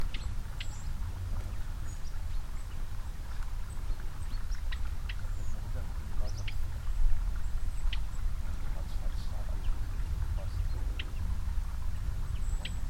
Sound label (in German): bartlaubsaenger